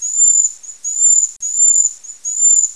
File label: waxwing